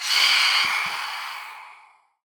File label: phantom shrieks; minecraft